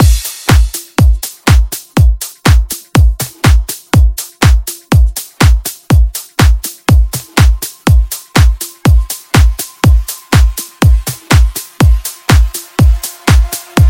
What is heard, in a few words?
Upbeat Redrum; Remixfreakz